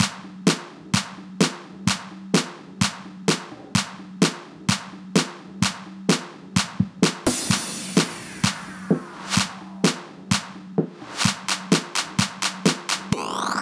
Fills 128BPM 8 Bars; Vengeance - Essential Clubsounds Vol.5

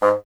WND BSN, Bassoon; Miroslav Vitous - Solo Instruments VOL-1

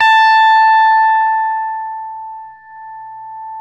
STRATOCASTR

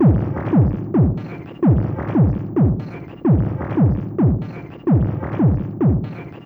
Crude Tape Loop, Tape delay; radiosonics

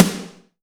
POWR SD, POWERDR; AKAI S6000 CD-ROM - Volume 1; DRUM RYTHM

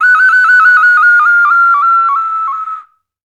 OCARINA; World Combo

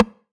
909 Rim; Goldbaby Tape808 & Tape909; The Tape 909

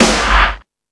Space Drums, E-MU Pro-Cussion; drum machine

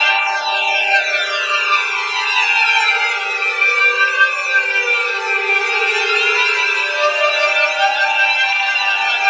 TANDOORI, INDIA DRONES; Spectrasonic Distorted Reality 1

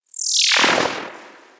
fx downlifter 150 shifted foley; Virtual Riot

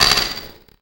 Noise Hit; One Shots; Drums & Drones

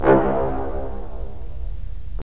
BRS, Roland LA Composer

